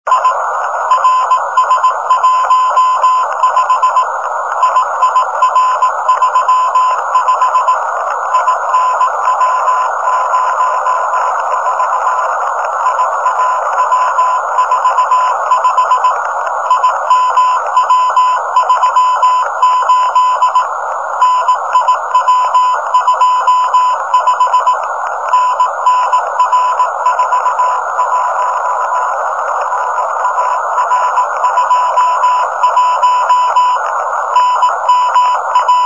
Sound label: cw telemetry; RS 15; CW telemetry signal